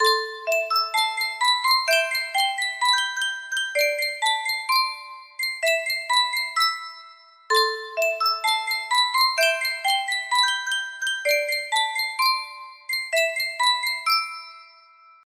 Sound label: sankyo music box, melody